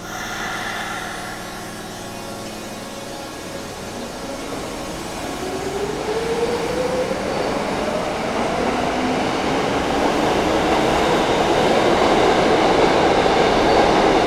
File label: SUBWAY; AKAI S6000 CD-ROM - Volume 6; Transportation